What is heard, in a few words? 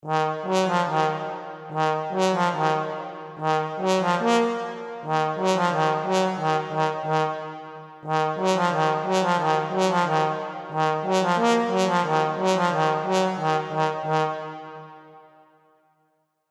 sea monsters brass trombone